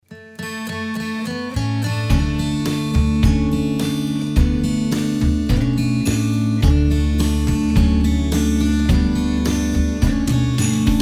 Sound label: Sa ta na ma instrumental; ramtitam